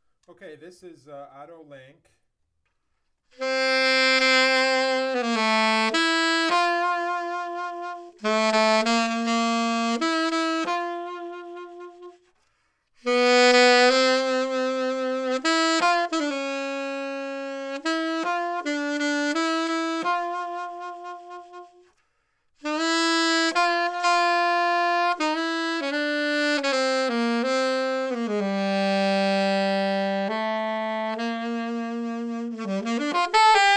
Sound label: Alto